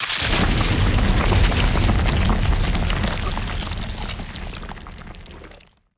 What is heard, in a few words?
JELLY ATTACK